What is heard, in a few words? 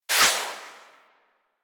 Infested, Tail; Warframe; Inf Cernos